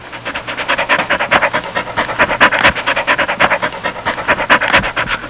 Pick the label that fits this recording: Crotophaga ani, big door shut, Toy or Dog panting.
Dog panting